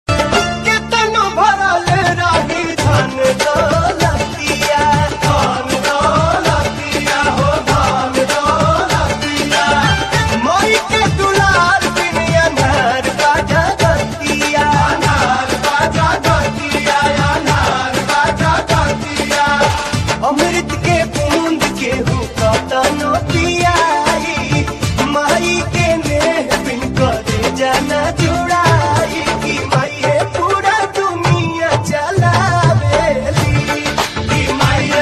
Bhojpuri Song

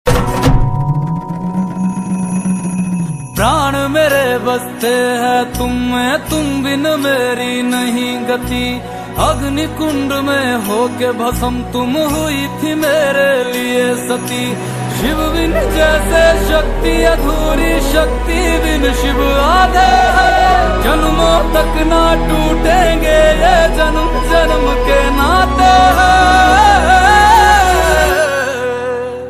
Mahashivratri song

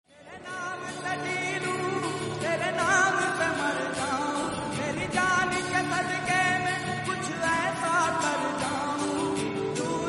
Lofi

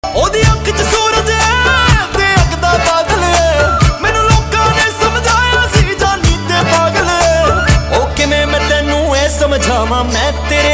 Rap

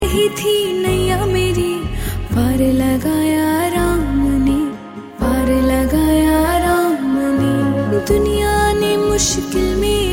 Ram Bhajan

